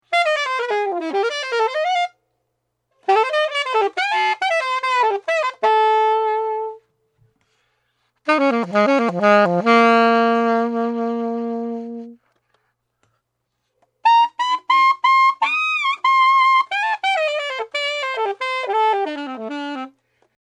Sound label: alto